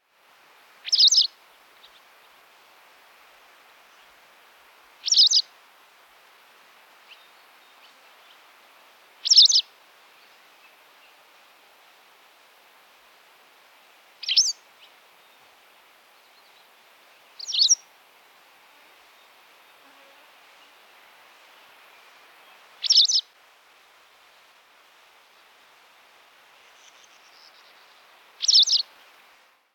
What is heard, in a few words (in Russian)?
belaya tryasoguzka motacilla alba